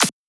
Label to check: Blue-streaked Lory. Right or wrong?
Wrong